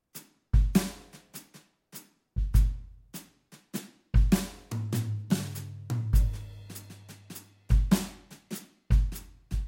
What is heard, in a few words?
Brush